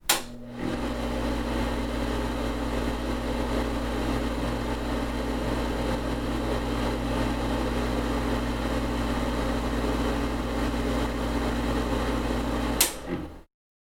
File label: Bathroom Fan